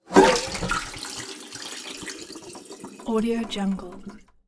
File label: cooking game pour out dump water small metal pot sink faucet